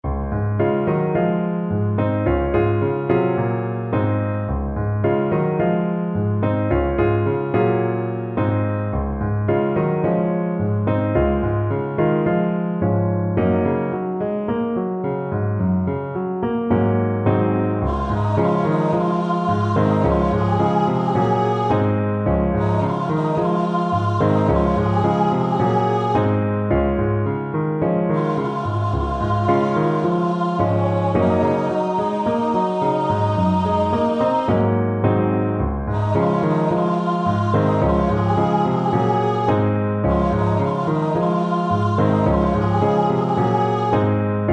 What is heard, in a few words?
Lullaby Arrangement